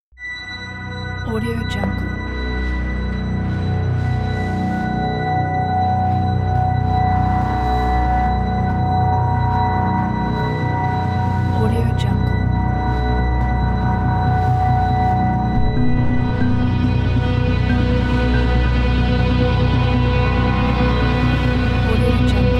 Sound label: fierce tension